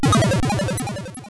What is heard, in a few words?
powerdown; Super Mario Land